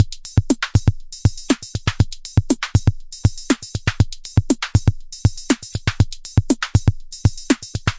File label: thin electro business, 120 bpm, drum loops and breaks